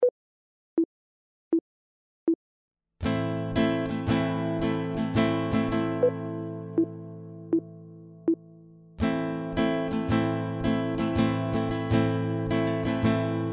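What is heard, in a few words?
chord, guitar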